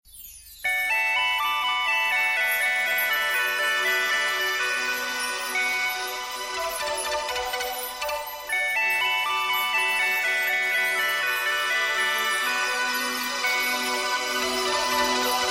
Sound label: beautiful sms tone; ringtones